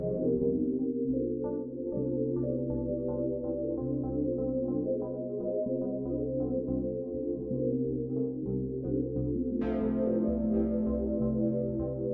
U F O Music; gamerip; door to door

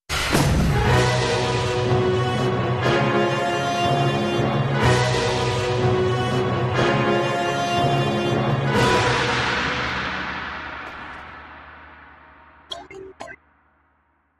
Boss Outro

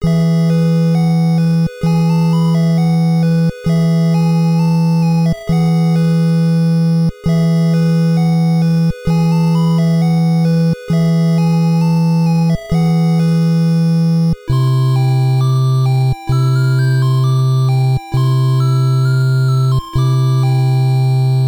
Ending; Genghis Khan; NES

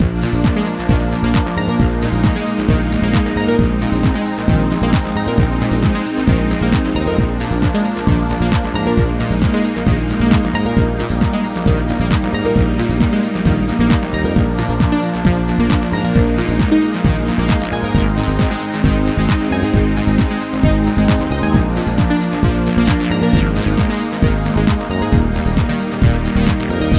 Synthy Thing; Dino Dini's Kick Off Revival; PS Vita

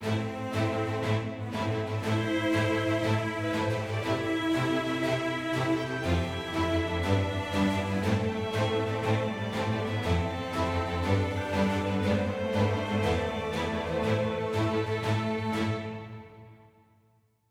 heroic med

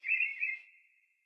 Amb Birdvillage Birds; Angry Birds Pop Blast

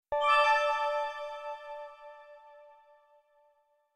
Fountainrecover Cue D; super mombo quest

